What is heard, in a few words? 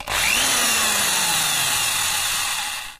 Drill Medium; friday the 13th killer puzzle